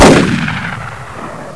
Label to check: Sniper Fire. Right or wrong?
Right